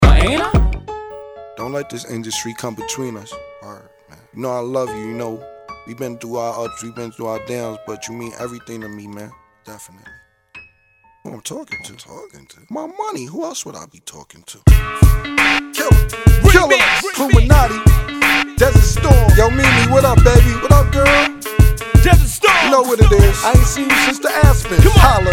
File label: freestyle